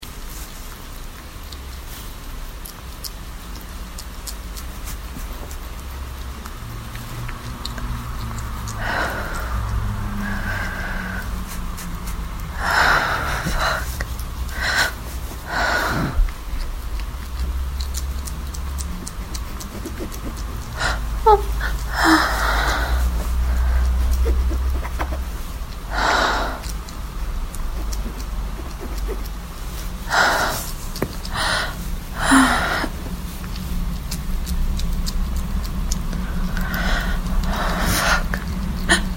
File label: Breathy play time